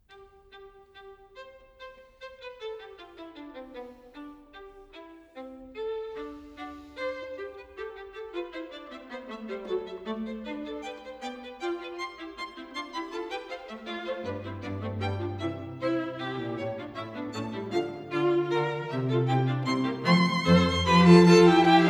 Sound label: String Quartet in C Minor Scherzo; String Quartet No. 4; Op. 18 No. 4; Ludwig van Beethoven; Beethoven - String Quartets Nos. 1-16